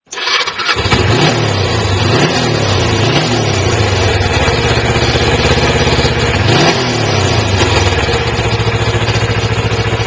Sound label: zzr250; 着信音やアラーム（目覚まし）音に使える、着モーションとして; FOMA